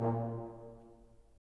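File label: brass; LA Composer vol. 3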